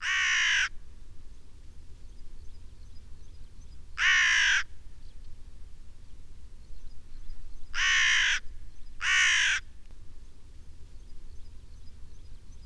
crow

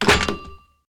phone hangup; DeadFright

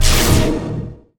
Scifi Forcefield Activate; Energy & Forcefields

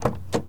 Mustang Door Open, Automobiles

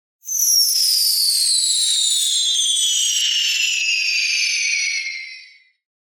Falling Bomb, Whistle - Medium Fly; Hollywood Edge; sound designer's toolkit v2

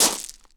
gravel land; firefight

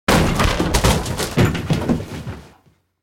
Door Crash By Monster; blindside

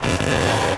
Stretch Twist, Foley Creaks; SFX Kit

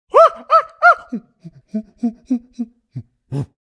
Monkey Lose; Pitch Black Audio Pong

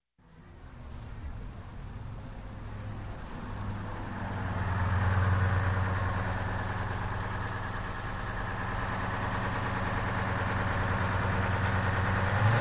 Bus, City Transit Pull Up, Idle, Pull Away; Sound Ideas 2000 Series

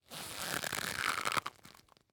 Latex Glove; Blastwave

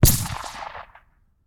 Bullet Impact; Sound Ideas; Guns

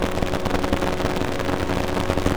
ELECTRICITY Fuzzy and Spikey loop; loop mono; Universal Sound FX

